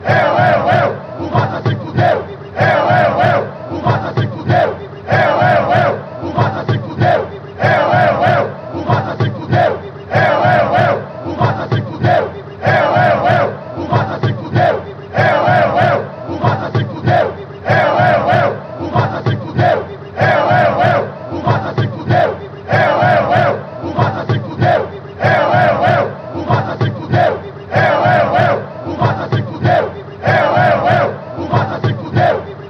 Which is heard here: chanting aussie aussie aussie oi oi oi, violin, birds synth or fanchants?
fanchants